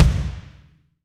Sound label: BD, DR BD; Best Service Gigapack 2